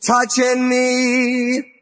touching me SUNG

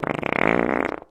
fart medium

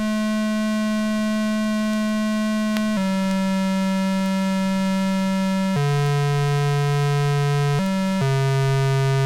spacey, Electronic Loops

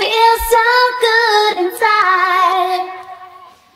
feels so good inside SUNG, Voice

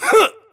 "huh", voice

